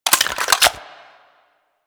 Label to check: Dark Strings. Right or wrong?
Wrong